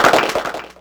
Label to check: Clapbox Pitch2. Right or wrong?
Right